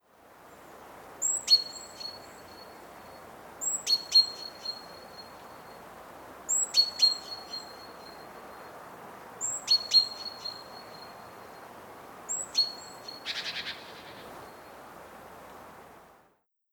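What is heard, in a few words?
Ambience birds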